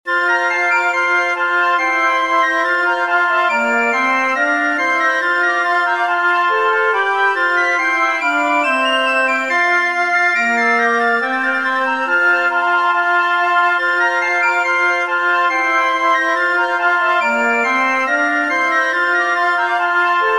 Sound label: for flute chorus; brade william; der erste mascharada des pfalzgraffen